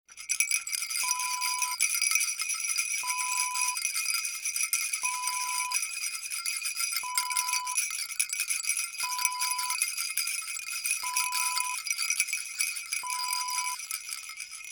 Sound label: baby rattle